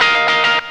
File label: CHORD, Stereo Guitars; E-MU Formula 4000 Series Vol. 1; Hip Hop Nation